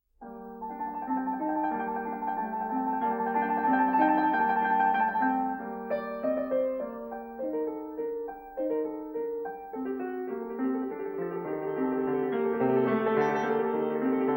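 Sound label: G minor Prelude, piano; BACH; WTC; BOOK I; Feinberg, Samuil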